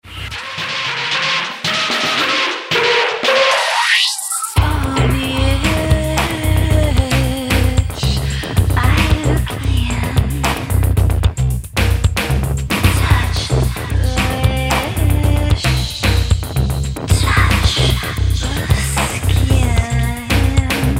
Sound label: distort